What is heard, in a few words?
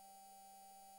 mic, mars2020 edlcam